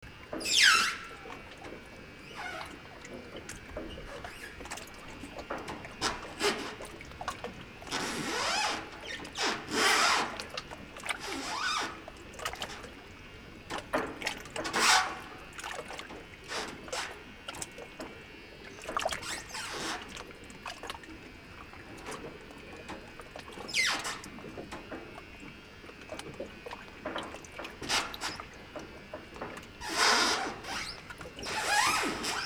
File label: screaming boat water electric Buzz engine